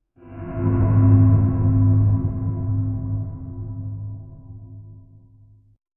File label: hollow reverb sound, string